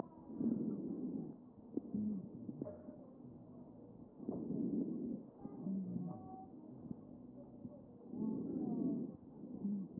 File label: chest